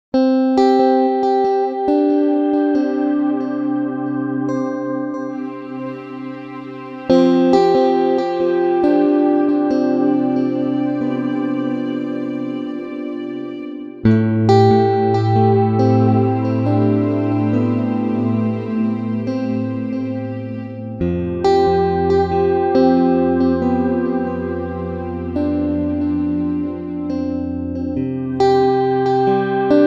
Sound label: F Guitar